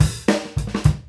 FILL

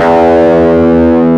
E1, GUITAR; AKAI S-Series CD-ROM Sound Library VOL-8

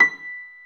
B5, GRAND PIANO; InVision Interactive; Lightware VOL-1; Instruments & Percussions

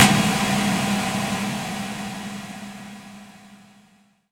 Impacts, Effects

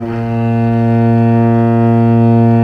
A#1, VIOLE, Historical Instruments; USB Soundscan vol.23

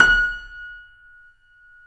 C7 FF, The Ultimate Piano Collection; East West

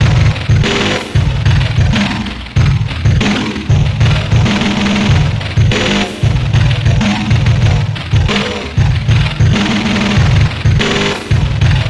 drums 89 bpm

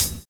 VINYL HH, HH CLSD, Drums Dance & Groove; USB Soundscan vol.11; AKAI

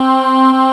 CHORUS C4; CMP - Analogon; TREMOLSKI

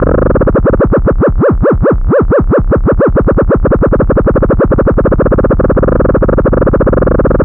JP8 C3, Jupiter 8; Big Fish Audio - First Call Vintage Keyboards

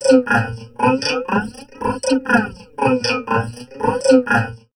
VOCOD, Drum Loops Crazy Processed

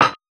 Hats, Alesis Performance-Pad

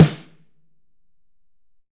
7X14, Drums; Big Fish Audio; Ross Garfield Drums VOL-2; ST LOUIS KIT

